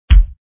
kick; demolition 2

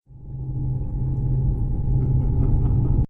start; Flashpoint; guy-cotten

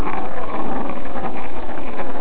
roll; labyrinth

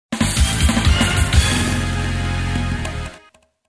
gameover; marvel; flashkof

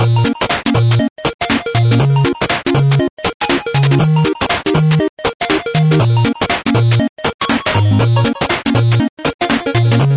music

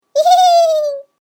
happy; Flashpoint; tobiidynavox; catchme

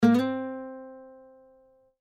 guitar; marubayashi; cat

